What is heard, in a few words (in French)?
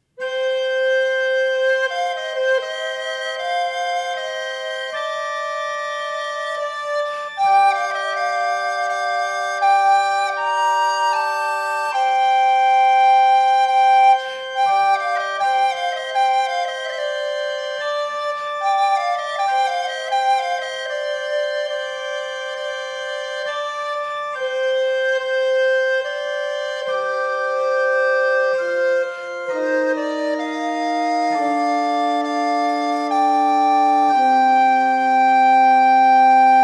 Musique